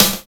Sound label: FILTH SNARE; Drums In Your FACE!